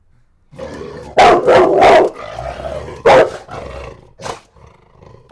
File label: rottweiler bark